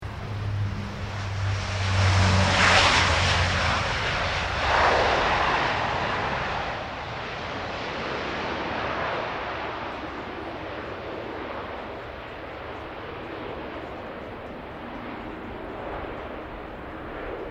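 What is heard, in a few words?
AIRCRAFT C130 HERCULES MANEUVERING, Lockheed; Airplanes; Vehicles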